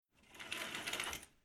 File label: Household Drawing Curtains Wooden Rails Sliding Closed Slowly; Window